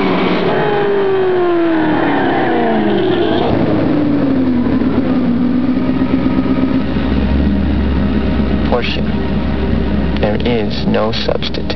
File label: porsche